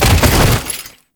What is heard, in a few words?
Body Fall Default; Enemies; Warframe; Tau Dax